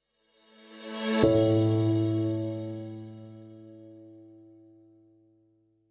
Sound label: Corporate Transition